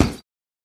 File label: Boxing Bag Hit Shrt Ha